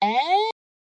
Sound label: formant filter vox hit g, Synthesized Voice Hits